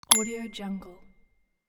vitreous click

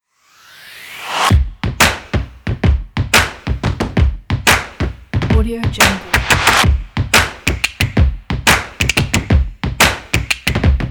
stomps and claps percussion kit

